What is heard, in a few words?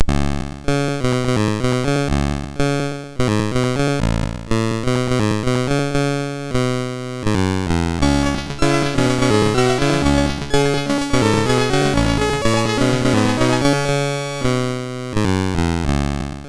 Intro, MOS 6581R2; First Cracking Girls